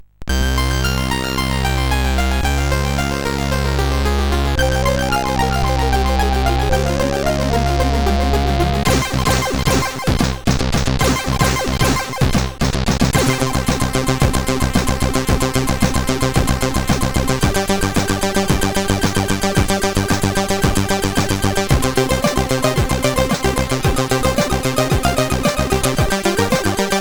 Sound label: sid CSG 8580R5; Teleport